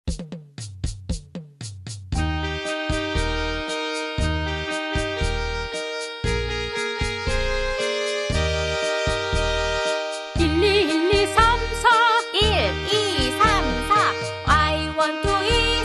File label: korean arirang food song